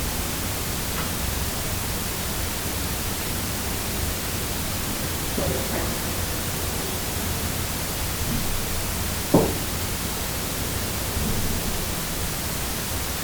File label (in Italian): Rumori colpi; Castello Salizzole